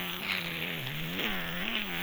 critter idle growling loop; nightmare criters

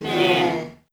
boo, race; Garry's Mod; racing tool

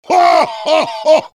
laughter big; heavy

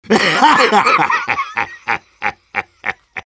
sniper laugh long; vo

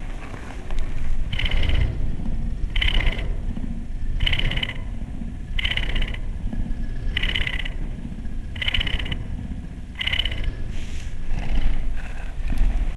catnap purring; nightmare criters

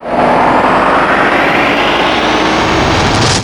cannon charge; weapons; loose cannon; garrysmod; tf2

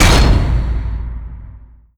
tunnel door closed quiet; ambient; Garry's Mod; tf2; rottenburg

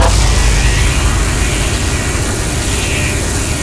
phlog ignite; tf2

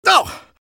pain sharp; engineer

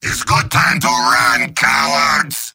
yell; heavy; mvm

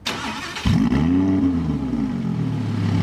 startup, vehicles, audi v10; autos v3; skyautos

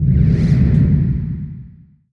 camera move; jupiter

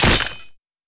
cleaver hit; tf2

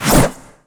katana, samurai

